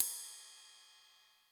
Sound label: Ride, Yamaha RY20